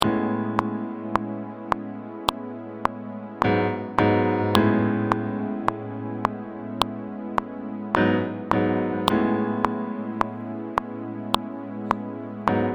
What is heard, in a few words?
Tenor